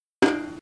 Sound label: bang